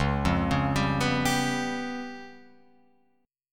C sharp 7th Flat 5th C sharp down Guitar Open F; C#7b5; arpeggiated; JGuitar

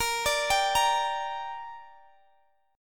A sharp 6th, guitar chord; A#6; arpeggiated; x,x,20,19,20,18

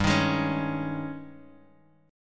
Ab Major 7th Suspended 4th G

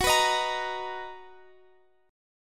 B Major 7th, guitar chord

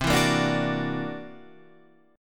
C 7th Add 9th Sharp 11th C, chord

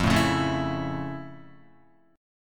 F Augmented Major 7th F Guitar; F+M7; strummed

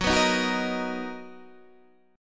B Major 7th F sharp, chord

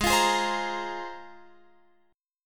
Ab 6th Add 9th Ab, Guitar, Open F; x,15,17,15,15,17; strummed

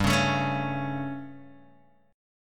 G Suspended 2nd Flat 5th G, Guitar, Open D; chordshape; down

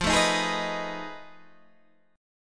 F 7th Sharp 9th Flat 5th, Guitar, DADGAD

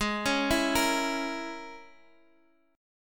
Bb Minor 7th Flat 5th

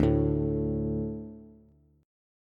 D 5th D, down, Bass; D5 strummed; JGuitar

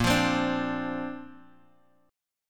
Bb Major Add 9th, Guitar, Drop D

